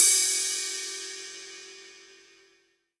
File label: Ride Hit Long; Colombo Acoustic